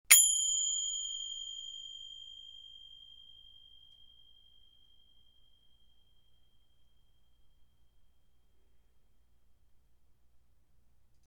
FX Finger Cymbals Thick

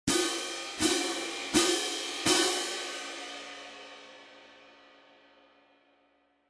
20in Symphonic Viennese Pair

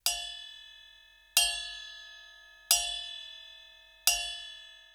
fx break bell Tip, cymbal sound; 7" FX Break Bell; FX Bells; zil-bel

